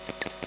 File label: chirp; physics radio